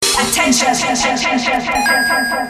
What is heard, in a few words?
gameboy attention